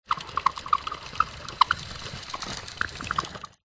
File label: toy train wooden roll surface short